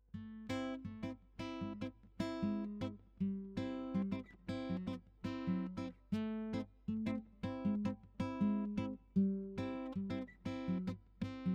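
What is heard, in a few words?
Samba Descending Chord Progression, guitar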